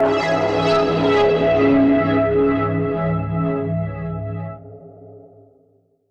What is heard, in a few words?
Techno; Goldmine for Serum